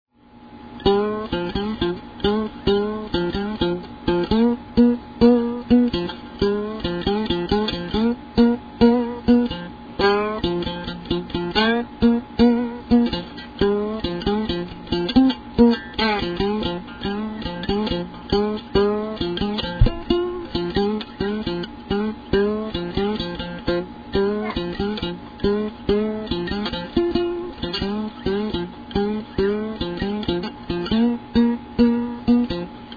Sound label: Ukulele (1 wound string); D.F. Duck